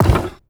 staff equip; inventory

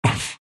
big pain; Undying

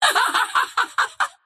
laugh; Vengeful Spirit

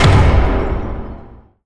profile open, ui menu

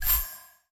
topmenu select; ui; dota

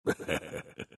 laugh; brewmaster

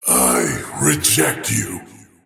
attack pain; Void Spirit

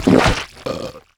swallow; dota; pudge

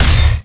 attack impact; dota; shredder

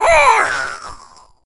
death; creep; greevil

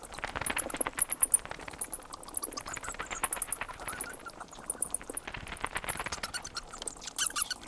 bats; dota

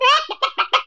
chicken

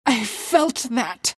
pain death; queenofpain

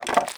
bucket foley; movement; tusk

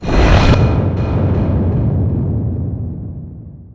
magnetize cast; weapons; dota; earth spirit

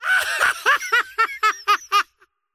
wheel laugh; dota; largo

